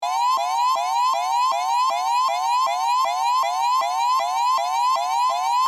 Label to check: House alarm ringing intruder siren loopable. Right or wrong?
Right